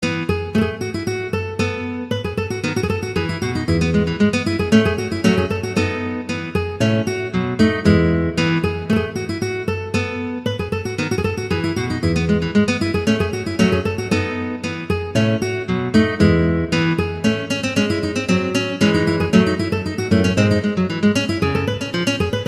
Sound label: Gavotte en Rondeau; Bach